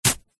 garden wooden sharp gardening tool push force into grass soil single; household